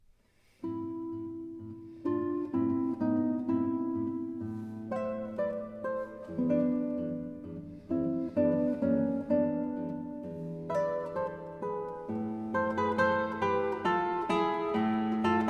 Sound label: Minuet No. 90 In A Minor